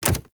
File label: Step Default; Warframe; Pets; Zanuka Companion